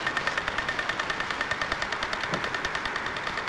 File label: Ticker